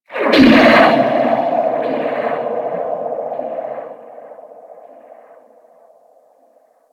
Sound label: Poison Plant; Warframe; Grineer Ocean